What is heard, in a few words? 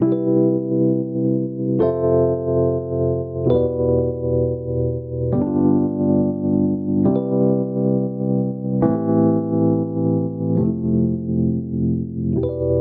Progression Pop Soulful Mood I - V - IV - vi C Major 68 bpm, Electric Keyboards; Ableton; Factory Packs